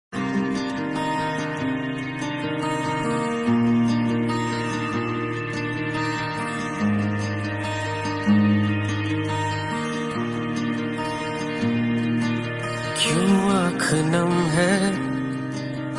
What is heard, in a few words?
Acoustic